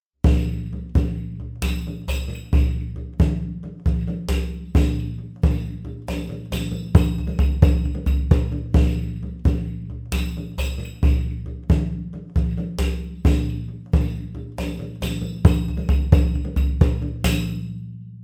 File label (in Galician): pandeira